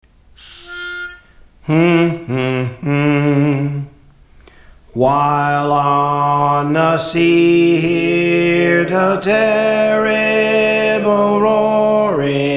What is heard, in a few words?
Soprano; Praise For The Lord Training